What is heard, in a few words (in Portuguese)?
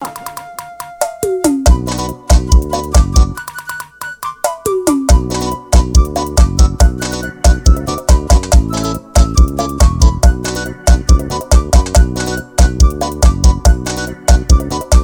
forro gravado ao vivo; em guamare